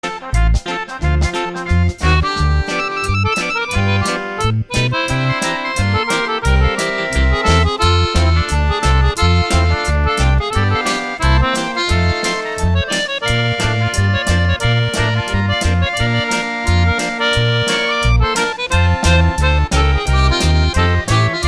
Ragtime Band

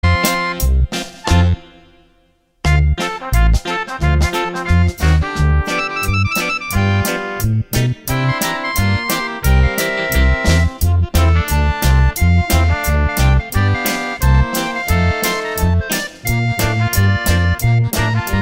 Band no accord

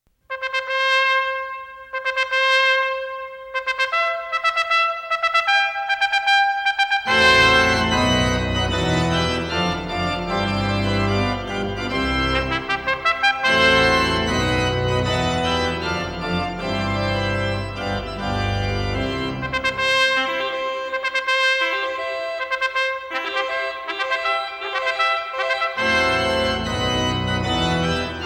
Wedding March

